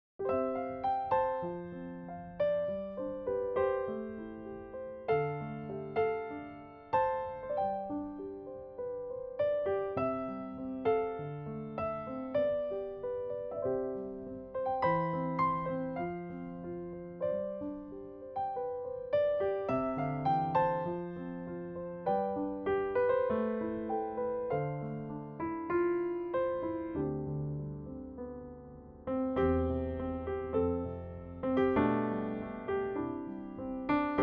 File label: Backing; jardim infancia